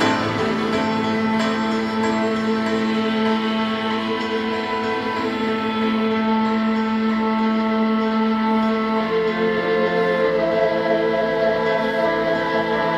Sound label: piano, sad loop